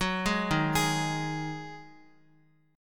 Eb Minor Double Flat 5th, Guitar chord; JGuitar

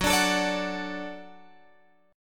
Gb Minor Sharp 5th Gb Guitar Drop D

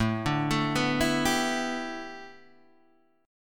A 7th Suspended 2nd Suspended 4th Guitar Open D

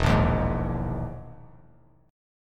E Major 7th Flat 5th down; EM7b5 strummed; JGuitar

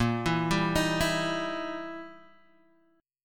E Minor Major 7th Flat 5th Bb

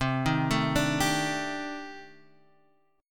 C Minor 6th Add 9th C Guitar Half Step Down

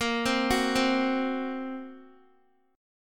Bb Suspended 2nd Sharp 5th, Guitar; Bbsus2#5; arpeggiated; x,13,10,11,12,x; JGuitar

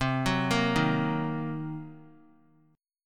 C Minor 7th Flat 5th, chord; Cm7b5 arpeggiated; JGuitar

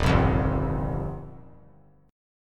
D sharp 6th Add 9th, D sharp, down; strummed; JGuitar

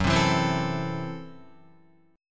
Gb Minor 7th Flat 5th, Guitar; strummed; JGuitar

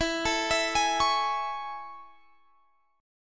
A Major 7th E

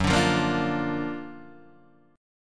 F sharp Augmented Major 7th F sharp Guitar DADGAD

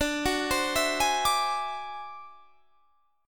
D 9th Flat 5th D, guitar

